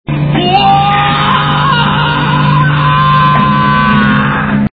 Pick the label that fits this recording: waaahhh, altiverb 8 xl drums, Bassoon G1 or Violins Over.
waaahhh